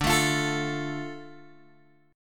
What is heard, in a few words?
D Minor D Guitar Open G